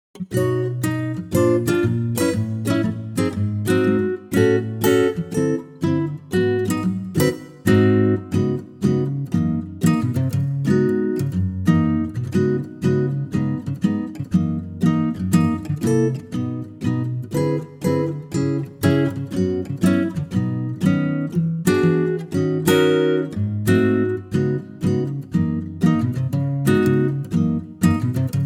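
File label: Acoustic Guitar B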